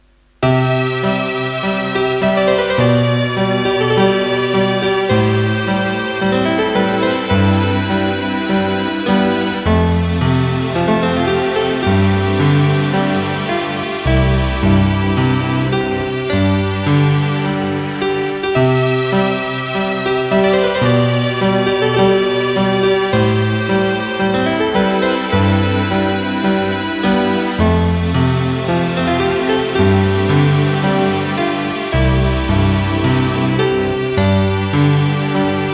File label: Midi Keyboard; AWE32; Hjemmelavet Fra Gamle Kasettebaand